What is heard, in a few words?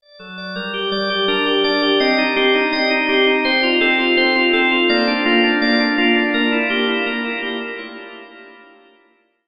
Ganymede Organ Theta